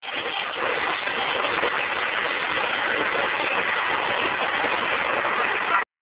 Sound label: plasma; highvoltage